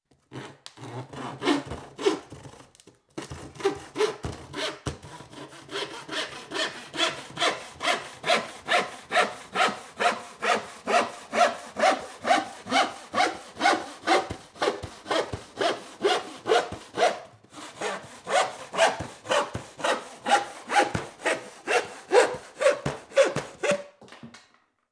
Hand Saw Through Sheet Of Plywood; Construction; BBC Sound Effects Library

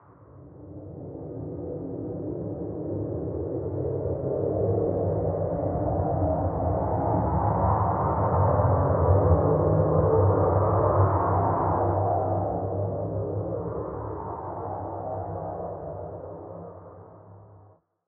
Atmosphere; 99Sounds; InterSpace

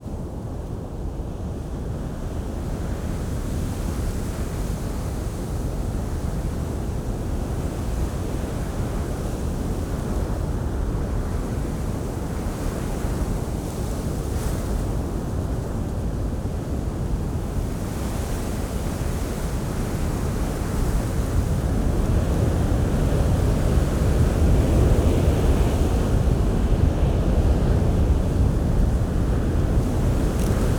Wind grass strong hurricane blast, Bora wind; European local wind; Ivo Vicic

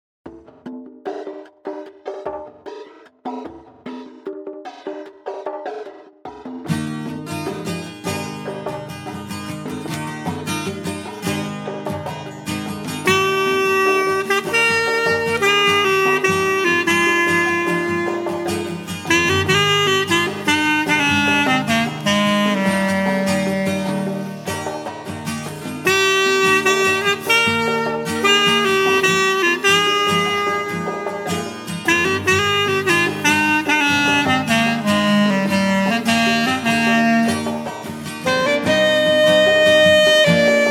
Easy Listening

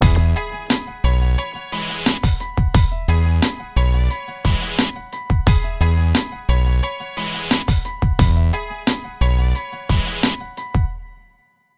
Signature Sound; FXHome; Signature Tracks

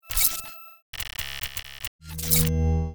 calculations n text spaced; SoundMorph; Users of Tommorow

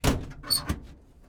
opel blitz door close interior; 3600; 1939; Pole Position; AMBEO

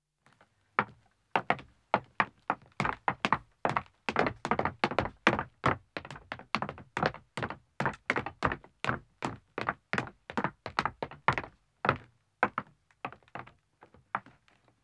Wooden Stairs Descending, 2 Me, Footsteps; BBC Sound Effects Library; Footsteps II

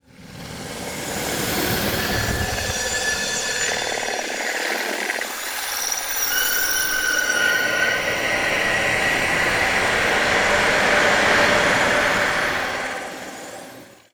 Atmosphere Sci-Fi; MatiasMacSD; SCI-FI ZONE CONSTRUCTION KIT

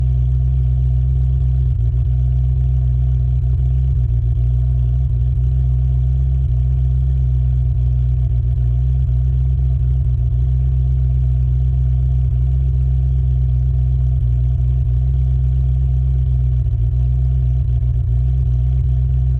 Ferrari F40 LM Onbrd Idle Steady Short Exhaust; 1991; Pole Position

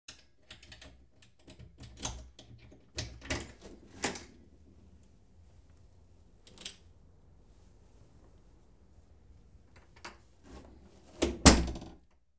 Front Door, Unlock Yale, Open, Cl; Household; BBC Sound Effects Library

